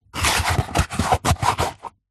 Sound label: shoe foot place in heel stiletto; foley; zapsplat